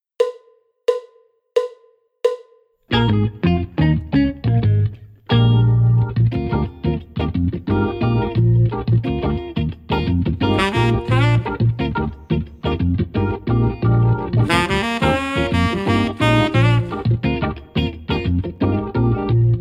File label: backing track without click